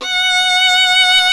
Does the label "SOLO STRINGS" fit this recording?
Yes